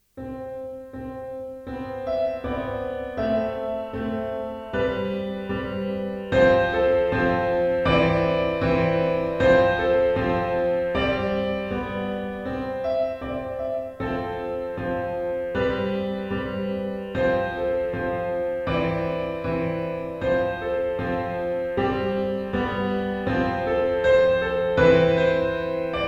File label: piano pop